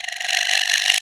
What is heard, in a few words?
PERC, Drum & Bass, 150 BPM; Ueberschall; Drum & Bass Flash Trackz